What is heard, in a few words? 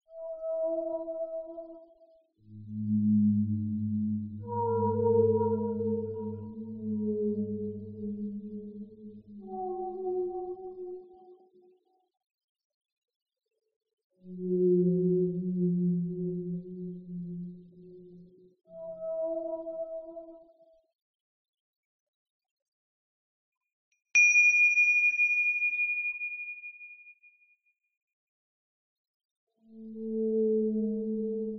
WHALES